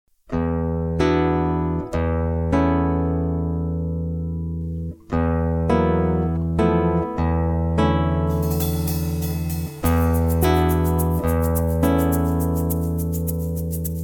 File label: BLUES, JAZZ, SINGBACK; ENGLISH